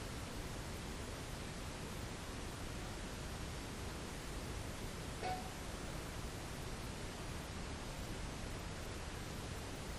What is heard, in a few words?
Tattoo Shop Static